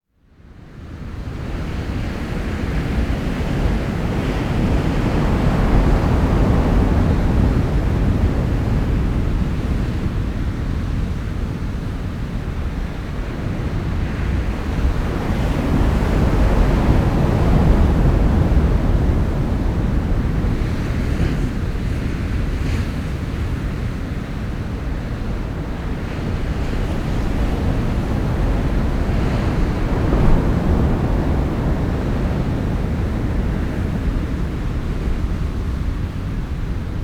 sea riffs far; best service Blue Box; sea ocean

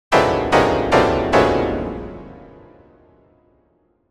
Piano Harsh Jarring Descending Chords; Hollywood Edge; sound designer's toolkit v2

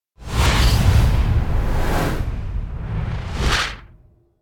Whoosh Into Multi Fire Impacts Distant Heavy; Hollywood Edge; sound designer's toolkit v2

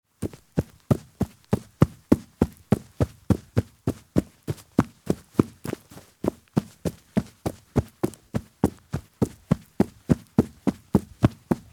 Heavy Boots Run on Grass; Sound Ideas; Footstep Sound Effects

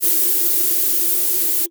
Gas Leak; 1001 Sound Effects

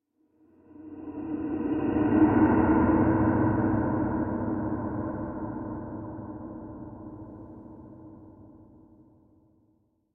Metallic Low Breath By Slow Danger; Hollywood Edge; sound designer's toolkit v2

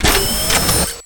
Gun Draw Select Scifi, Weapons Foley; SFX Kit

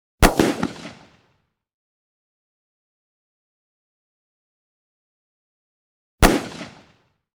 .357 Magnum Pistol Blasts Close Range; Hollywood Edge; Heavily Armed

